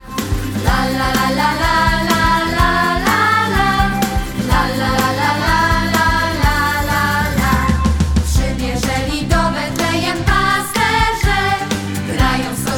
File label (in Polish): wersja wokalna; PRZYBIEŻELI DO BETLEJEM